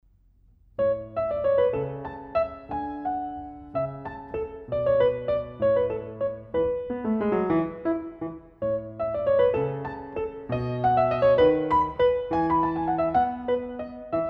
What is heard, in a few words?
Gigue in A Major, piano